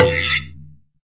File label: Processed Ethn Perc, Doru Malaia Percussion